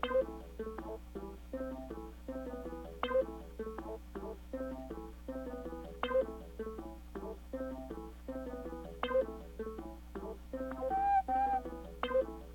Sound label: groove